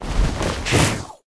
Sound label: gargoyle attack, monster